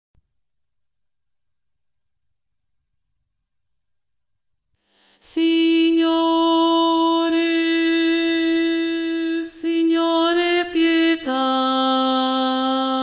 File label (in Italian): Signore pieta soprani; Voci; studio canti